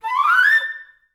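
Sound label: flute run up A4; Best Service; Peter Siedlaczek's Advanced Orchestra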